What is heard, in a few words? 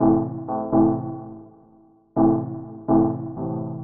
DEEPHOUSE ORGAN 125 A